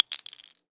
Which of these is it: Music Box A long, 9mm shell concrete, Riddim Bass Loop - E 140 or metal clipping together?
9mm shell concrete